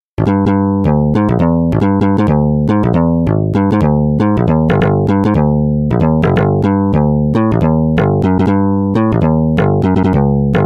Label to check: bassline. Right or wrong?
Right